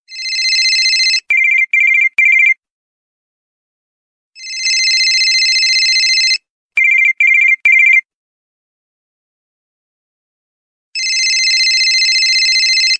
cell tone

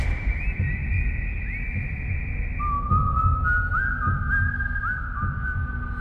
whistling; Supernatural Benny

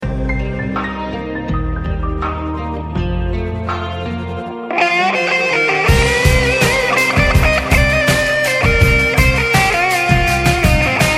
guitar; Indian

